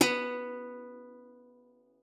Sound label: B3, guitar; Xsample VOL-2